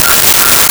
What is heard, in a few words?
Whistle F5; Nintendo; July 2020 Gigaleak